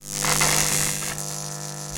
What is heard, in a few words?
Lightning